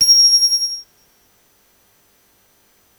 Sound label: soft tines F7